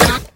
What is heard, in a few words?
skull hit; necro; hauntingdead